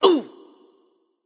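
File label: grunt hit, female; smite; voicepack; hera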